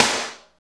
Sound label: SNR, Tekno Industrial; East West